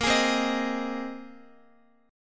A sharp 7th Suspended 2nd Suspended 4th, Guitar; A#7sus2sus4; strummed

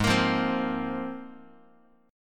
G sharp 6th Add 9th G sharp Guitar; strummed; JGuitar

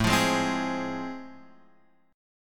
A Minor Major 7th Sharp 5th, Guitar Open F; strummed; AmM7#5; x,4,5,3,3,3; JGuitar

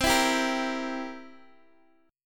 C 7th C down Guitar Open D

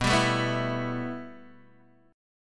B 7th Suspended 4th Sharp 5th, Guitar, DADGAD

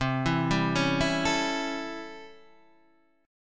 A 7th C, chord; A7/C; arpeggiated; JGuitar

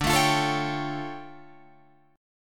D-Minor Major 11th, D, Guitar, Drop D

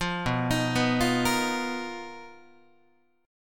B Major 7th Flat 5th B down Guitar; arpeggiated

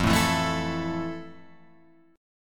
F Major 7th, Guitar, Open F; strummed; JGuitar

